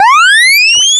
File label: screaming alarm; pyledriver